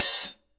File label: reverb open hi hat b key